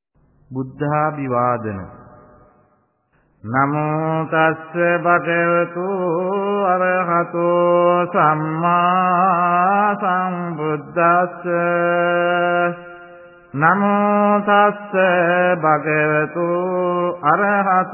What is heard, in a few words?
chant, Buddhist Chanting